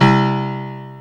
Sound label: PIANO LO